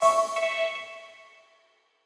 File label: Quasar Clear B